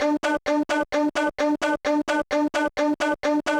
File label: kawai k1 OMNIBUS LOOP; wavetables